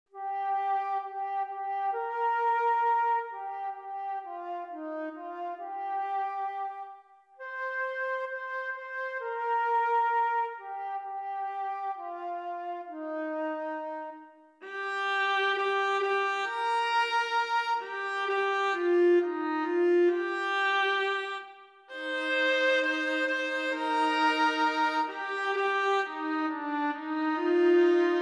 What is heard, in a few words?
INSTRUMENTAL; Tell Me Dear Lord